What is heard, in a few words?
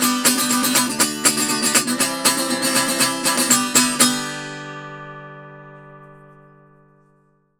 Tenor Guitar, Appalachian